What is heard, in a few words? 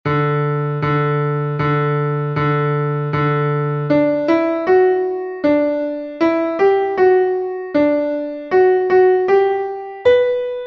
in D chords with intro measures; Satisfied